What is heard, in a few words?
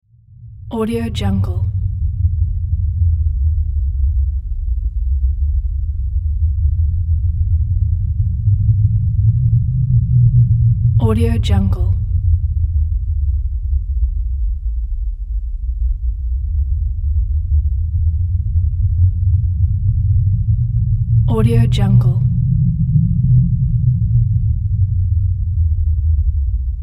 wind rumble